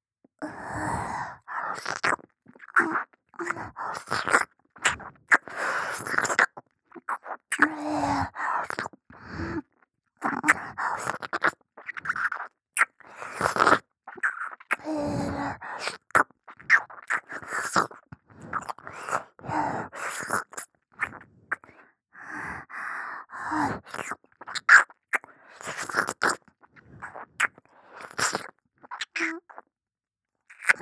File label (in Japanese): 耳舐め, ASMR